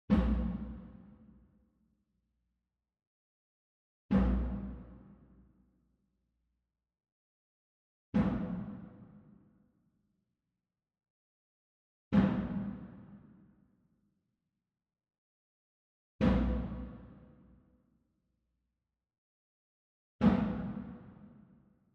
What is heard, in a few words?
SMALL DRUM Tabla Flam Soft Beater Far; boom library; Cinematic Strikes Construction Kit